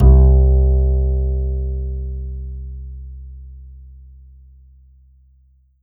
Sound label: Roland SC-88 Acoustic Bass C2